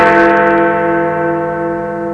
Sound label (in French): cloche; kerloret